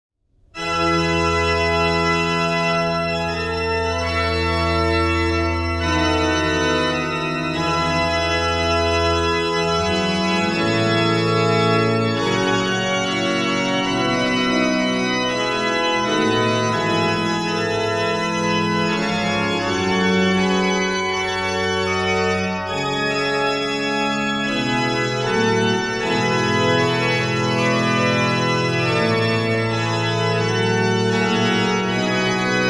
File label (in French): grand plein jeu, orgue; Corette